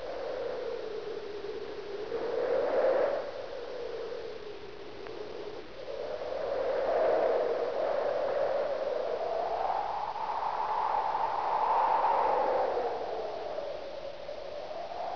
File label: thunder; Halloween